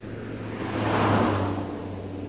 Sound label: CAR